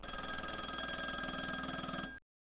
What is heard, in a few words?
phone